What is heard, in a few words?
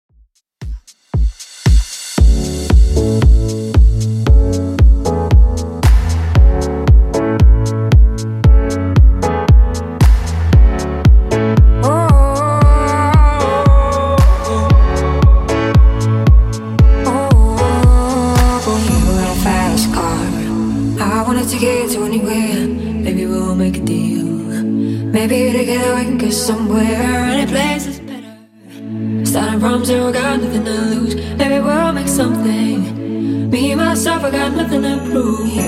2ks Redrum 115bpm